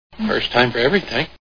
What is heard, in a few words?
scream